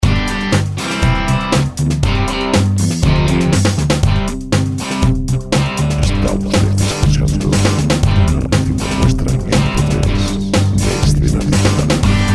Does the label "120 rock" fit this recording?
Yes